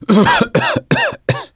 cough, survivor voice; gambler

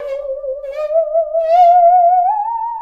saw danger, music, zombat

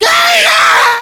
ignite, infected male; Left 4 Dead 2; npc

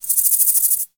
random insect; ambient

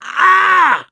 death scream, mechanic, survivor; voice

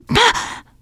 reaction startled, teen girl; player; survivor; voice

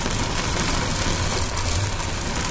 cardboard box scrape rough loop; physics

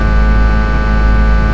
generator ambience loop; Left 4 Dead 2

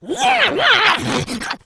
rage, infected, female

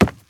wood, footsteps infected run

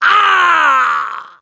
hurt major, mechanic; voice

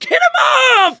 scream while pounced, mechanic, survivor; voice

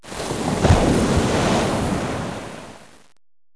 wave, water

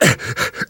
choke

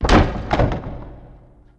wood debris

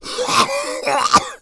smoker lurk

